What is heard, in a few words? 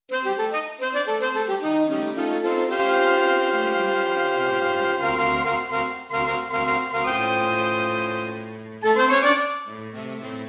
funky sax